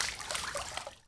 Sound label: tread; ambient; Shadow Man